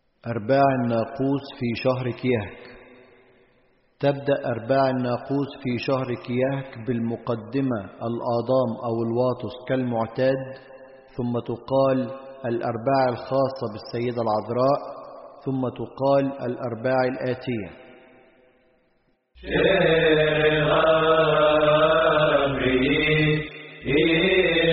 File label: Verses Of The Cymbals; ارباع الناقوس